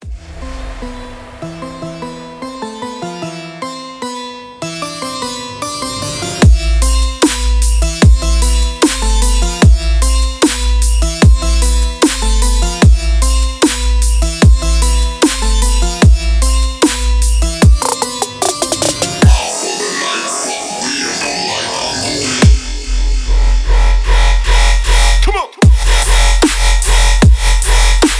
Wild bass

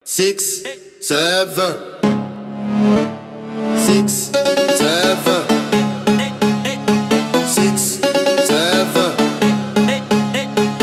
phonk

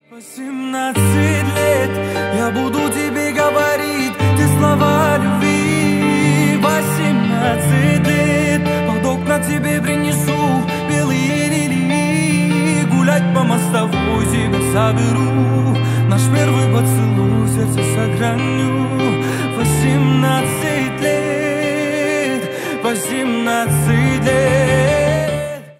acoustic version

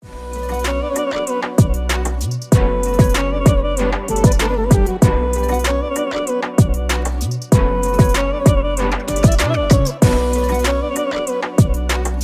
lela trap oriental

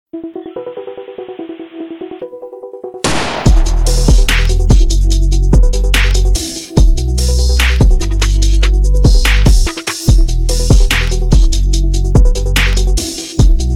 instrumental

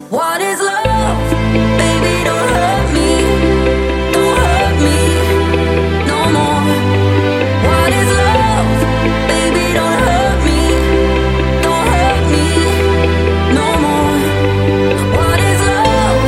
acoustic

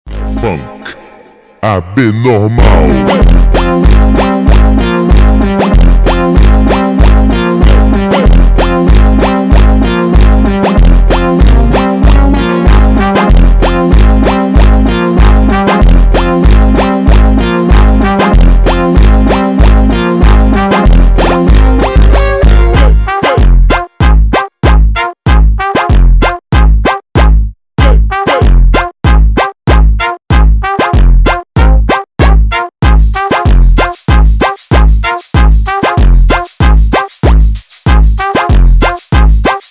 funk abnormal ultra slowed

